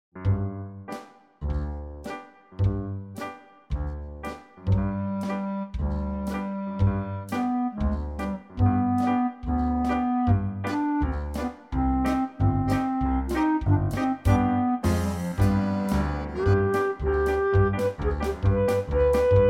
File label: Cm play clarinet Reference